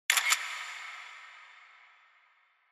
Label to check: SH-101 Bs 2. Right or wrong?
Wrong